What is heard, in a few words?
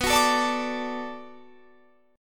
D Major B, guitar; D/B strummed